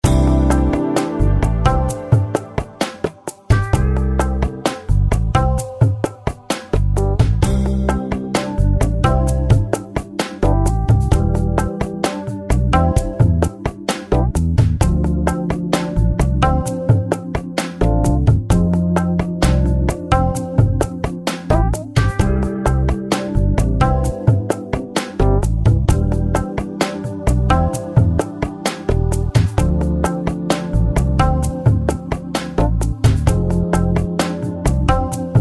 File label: rhythm blues